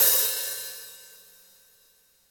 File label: crash G key, Cymbal